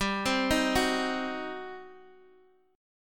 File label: G Major 7th Suspended 4th Sharp 5th, Guitar, Open D; down